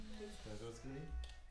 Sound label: Choir; Decca LR